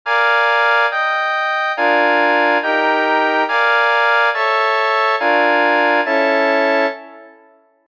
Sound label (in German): enharmonische ausweichung; gathy 1840